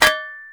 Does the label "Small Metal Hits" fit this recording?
Yes